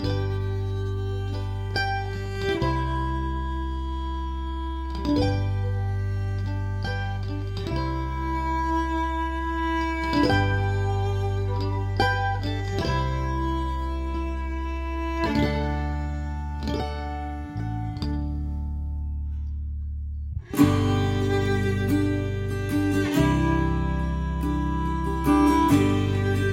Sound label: solo loop